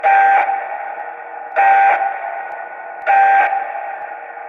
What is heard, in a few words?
Alarm; Barotrauma; TSFX